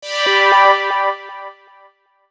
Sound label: Sweep Slide; Sony Ericsson; W595; PlusFourSix; Lars Edvall